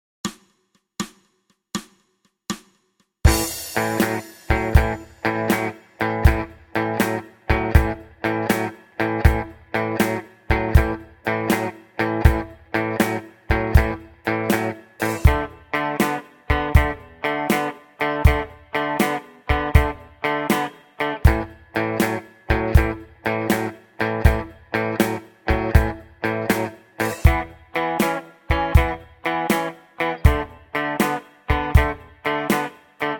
blues

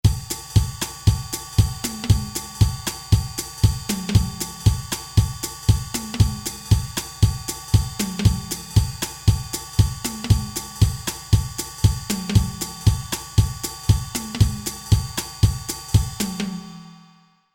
Jazz

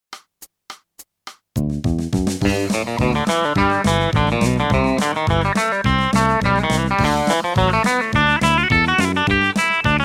country

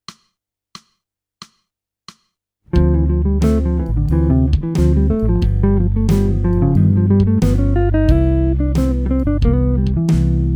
jazz

